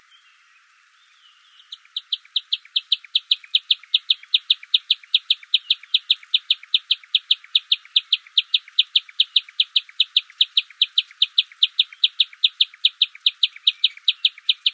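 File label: warbler bamboo, Mahale Mountains; DMoyer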